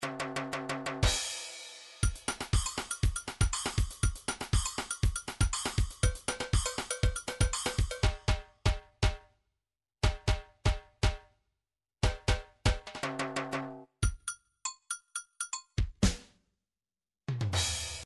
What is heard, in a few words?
midi drums samba